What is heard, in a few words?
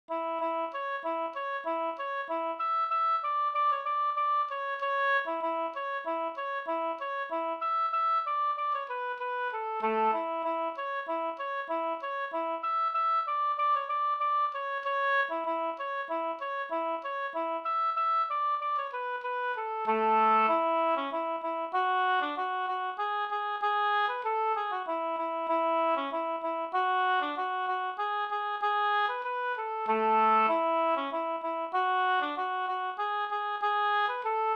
polka